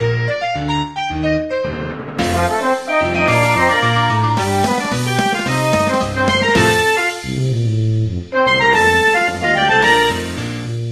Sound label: elevator